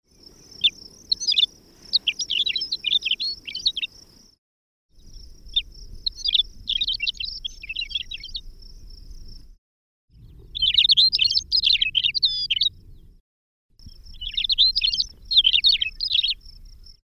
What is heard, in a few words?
Longspur McCowns Ground